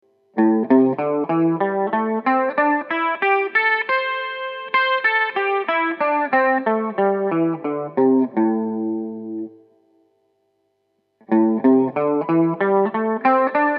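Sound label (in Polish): gitara elektryczna pentatonika a-moll skala 1 pozycja 5 próg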